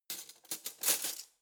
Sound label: cutlery spoons drawer grab hard